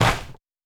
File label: Snow